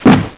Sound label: unload; MPG-Net (USA); empire